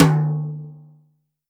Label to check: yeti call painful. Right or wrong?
Wrong